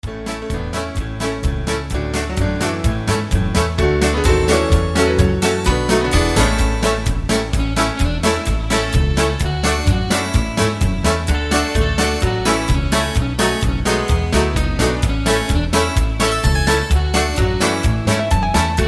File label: Instrumental